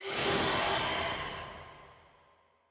maiden wail

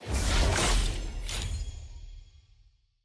reveal shard rarity epic; loot

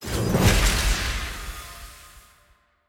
sfx trans archive endmodal

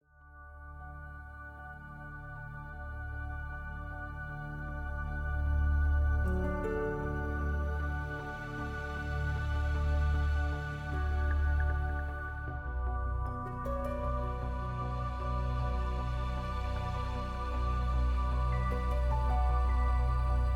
music inqueue loop; starguardian

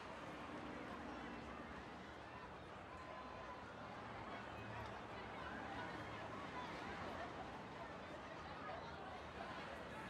amb

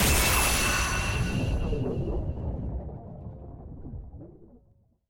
skill execute; pyke

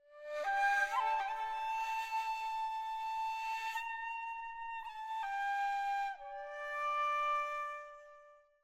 prop flute; yasuo; lol

